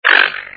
rat attack

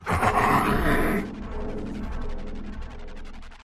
Rat stun

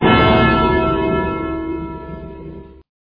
fight over defeat

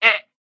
goat wound

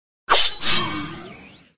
werewolf boss buff

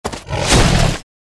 Tiger

